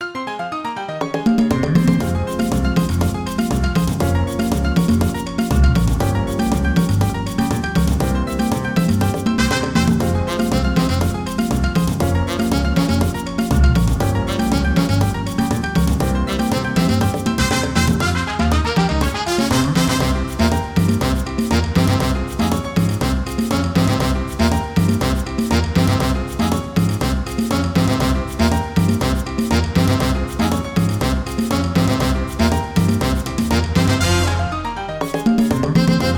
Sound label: Merengue